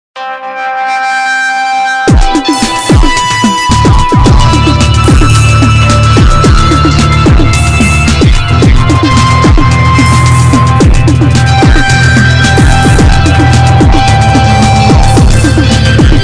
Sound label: 80s tvs